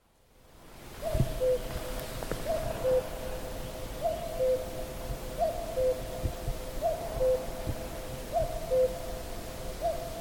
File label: cuculus; käki; laulu1; linnut